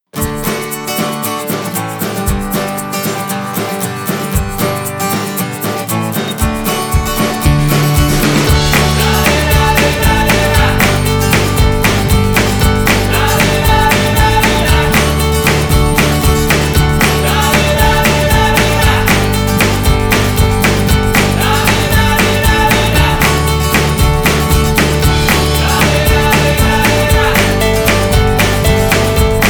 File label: Music For Advertising Commercials; Royalty Free Production Music Volume 1